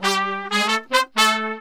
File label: BRASS SALSA; Best Service ProSamples vol.14; AKAI